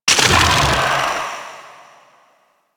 Devour Capture Release; Warframe; Mummy

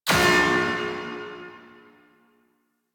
Puzzle Projector Activate; Missions; Raid

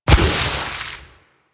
Vial Corrosive Shatter; Warframe; DE; Warframes; Alchemist; Elements; Ability

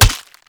Hammer Impact Flesh; Weapon Impacts; Player; Combat; Tuvalkane Hammer

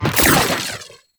Hourglass Pickup; Warframe; Duviri Murmur

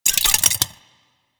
Metallic Move; Enemies; Sentient; Sentient Movement

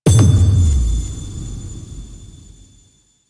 Choice Menu Select, UI; Warframe; Quests; The New War; Table For Two

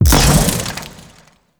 Sentient Death Explosion; Enemies; Sentient Movement

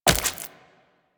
Wall Jump Flesh

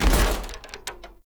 Foley Death Body Fall; Enemy; Nimrod

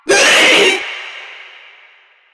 Proto Infested Runner Vocal Short Attack, Enemies; Warframe

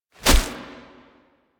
Wolf Step; Animals; Warframe; Quests; Duviri

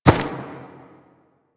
Ram Step Walk Grass; Animals; DE; Warframe; Quests; Duviri

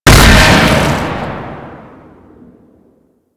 Golem Gun Fire; Warframe; Attacks

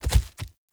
Wolf Steps Land Default; Animals

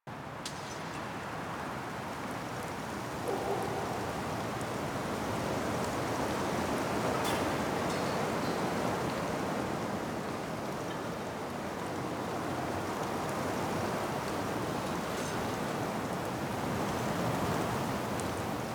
Winter Exterior Large Bed Loop; Warframe; Ambience; Nineteen Ninety Nine

